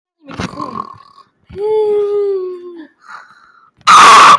sleeping sound

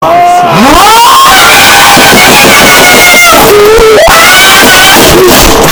angry korean gamer screaming

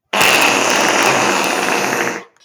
fart

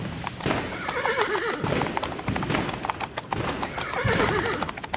pew

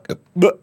burp

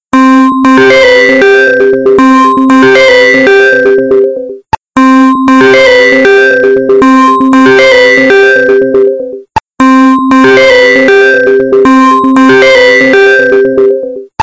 goofy ringtone loud

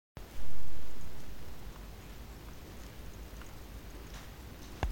hissing sound

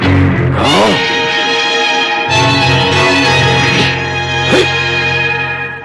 dun dun dun

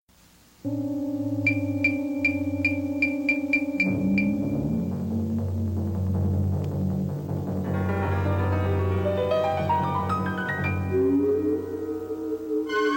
sinister cinema

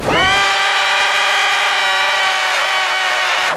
jumpscare sound; five nights at silnnxeaman

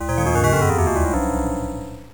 chute sound; marble madness

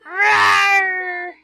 rawr

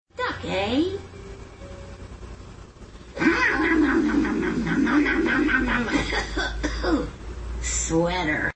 eating coke

